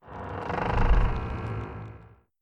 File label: swinging bridges; Kismet